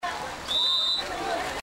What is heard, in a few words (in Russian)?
swistok trenera